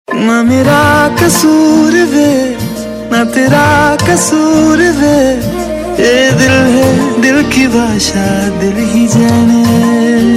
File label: Love Tune; Abhishek Meera; TV Serial Tone; Sony; Bade Bhaiyya Ki Dulhania